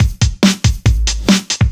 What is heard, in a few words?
Breakbeat; Vengeance Samples; VEC2 Loops